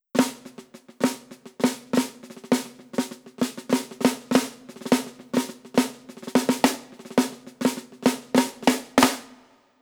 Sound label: dead snare; Pearl Masters 12" "All Maple" Snare